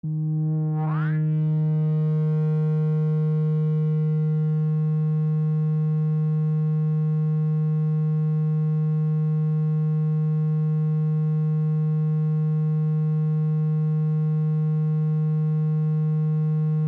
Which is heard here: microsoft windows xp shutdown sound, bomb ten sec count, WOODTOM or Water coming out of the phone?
Water coming out of the phone